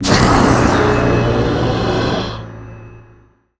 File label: death